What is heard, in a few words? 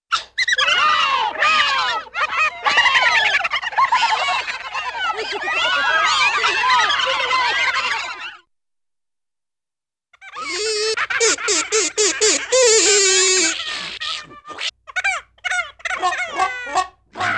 Various Small Animals Laugh Cheer Yowls Barks, Cartoon; Hollywood Edge; Cartoon Trax